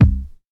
nice kick single shot c key, kick drum single shot tuned to the C note